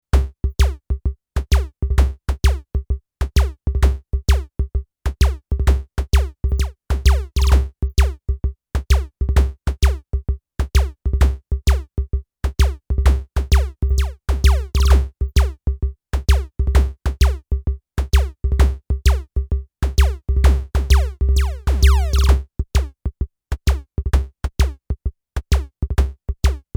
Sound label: Synth Low und High 2.75 dB; Fredenstein F610 UE1